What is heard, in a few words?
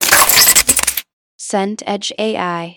paper crumple